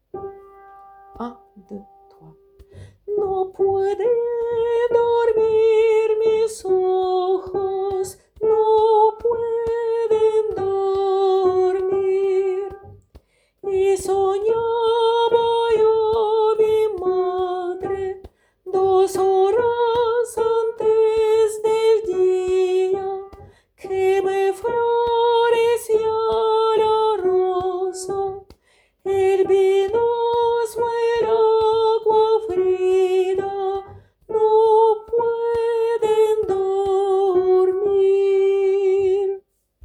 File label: No pueden dormir Soprano